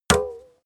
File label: paint metal tin hit sing